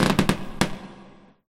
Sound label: plank creak; scripted sequences